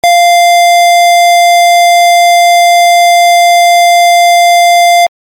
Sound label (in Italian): suoneria beep